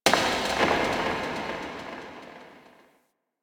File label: interior cave rock smash; Ambience; Misc Indoor; DE; Warframe; Infested Eris